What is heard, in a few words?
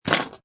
Pinball Sound Ball Sprung; Video Games; Sound Effects:Games